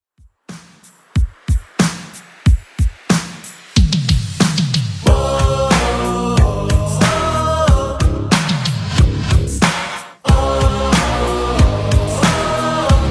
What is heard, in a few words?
80s Rock Redrum 92 bpm